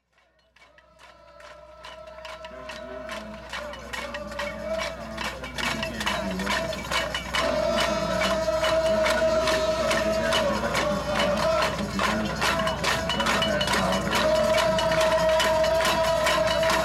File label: The Rain Chant Original Field Recording Live